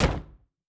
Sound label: Shield Wood